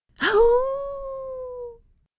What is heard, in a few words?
howl; TajemniceDomu